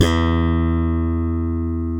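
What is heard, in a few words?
Electric Bass Slap, Roland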